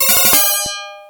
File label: 1up; ringtones; Notifications; TwinCobra